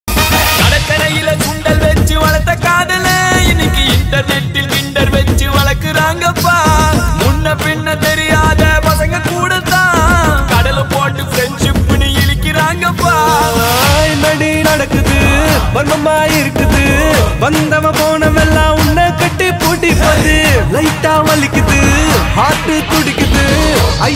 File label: tamil ringtone